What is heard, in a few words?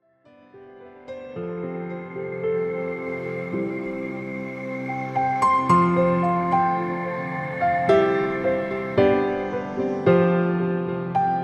Ambient background building, Video Music